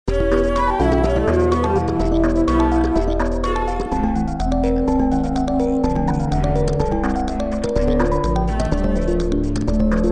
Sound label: Sing